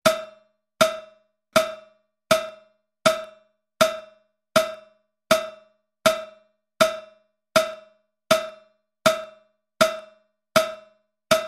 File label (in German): metronom